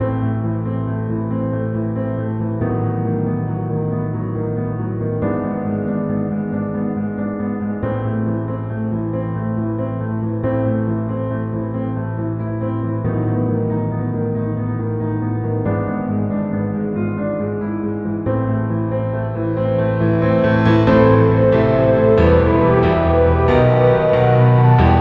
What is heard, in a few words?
Dark night space